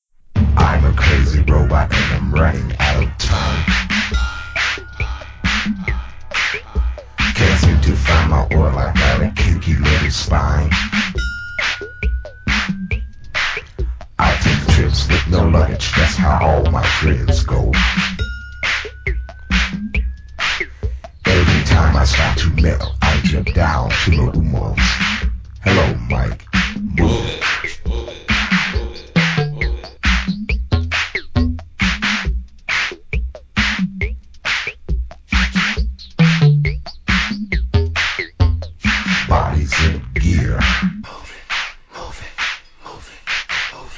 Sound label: Original Instrumental